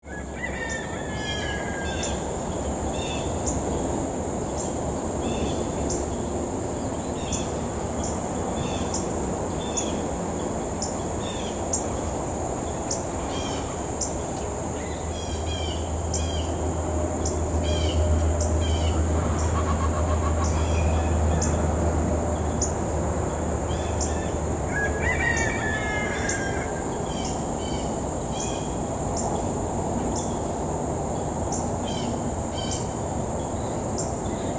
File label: farm